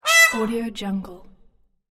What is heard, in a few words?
peahen call